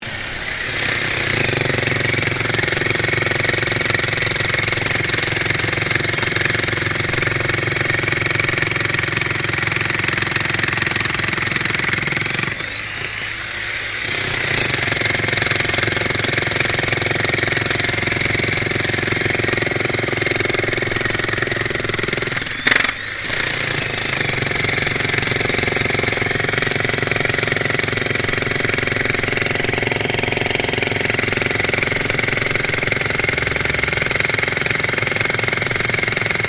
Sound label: JACKHAMMER BOSCH ELECTRIC DIGGING BITUMEN STEREO, Construction Bosch jackhammer